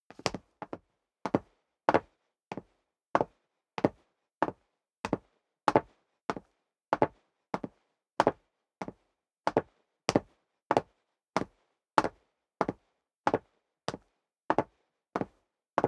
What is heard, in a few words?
step sneaker wood parquet downstairs sequence